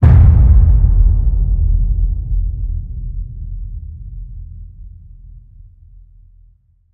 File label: HIT Low, Cinematic Hits Designed